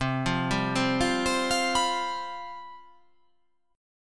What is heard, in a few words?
C Major 7th Suspended 4th, down